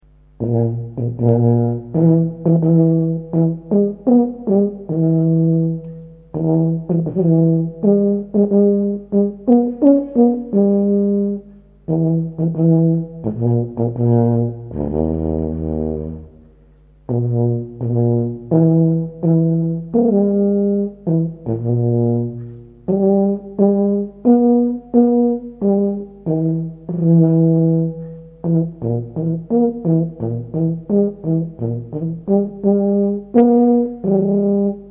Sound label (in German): papiertuba